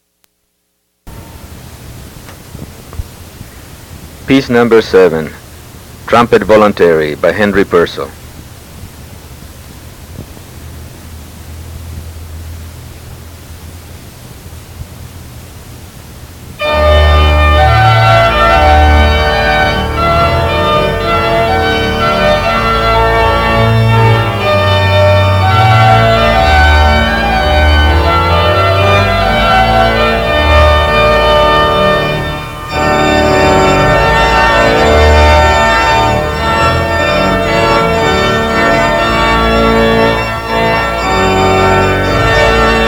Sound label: Trumpet Voluntary